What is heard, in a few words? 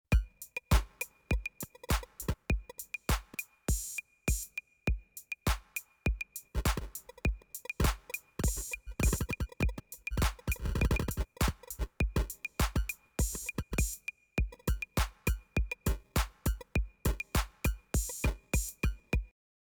Bastl Coarse